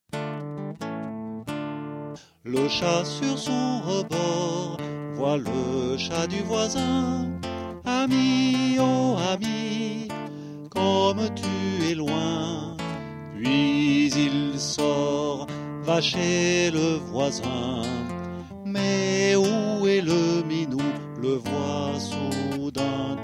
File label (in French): canon a chat